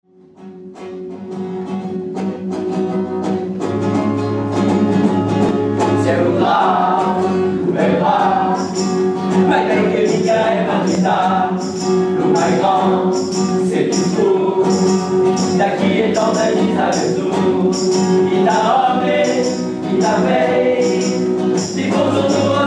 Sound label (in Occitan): cansoun live